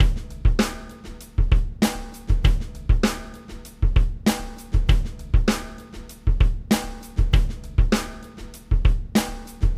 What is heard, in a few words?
snare dry